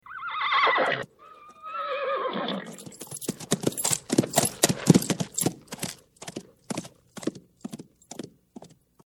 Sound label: Horse